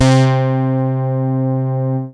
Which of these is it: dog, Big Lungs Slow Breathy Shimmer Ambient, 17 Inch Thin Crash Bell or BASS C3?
BASS C3